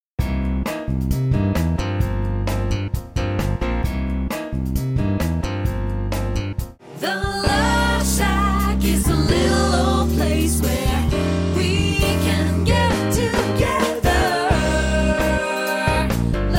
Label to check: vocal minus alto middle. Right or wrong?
Right